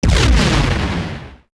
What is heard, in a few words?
Pulse Cannon; Sid Meier's Civilization 4 Complete; Beyond the Sword; Final Frontier; Units